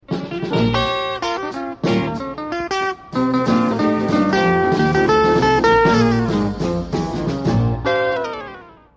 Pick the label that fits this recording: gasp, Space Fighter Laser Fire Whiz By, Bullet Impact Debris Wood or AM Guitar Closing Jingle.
AM Guitar Closing Jingle